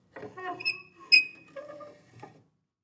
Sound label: stove handle turn; prosoundfx